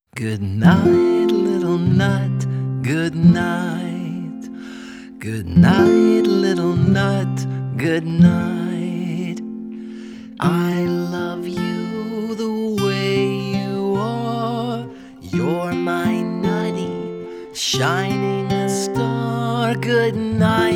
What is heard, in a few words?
Lullaby